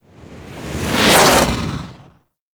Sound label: Melee Swipe; Teralyst; Ropalolyst; Abilities; Melee Grab